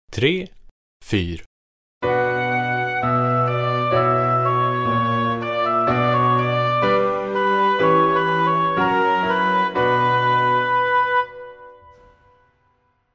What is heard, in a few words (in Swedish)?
Rytm; Övningsprov; Musikex